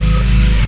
Fx Vox; Equipped Music; Loungin House

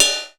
CYM BELL; Univers Sons; Basicussions

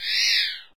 Wind; SNES; Benkei Gaiden

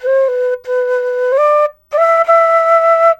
SERULING, Heart of Asia

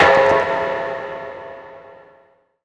PERC FX, Dance Drums

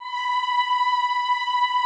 OHH B4, Classical Choir; Best Service; Extended Classical Choir

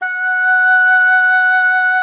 F#5, OB D.AMORE; Xsample VOL-7

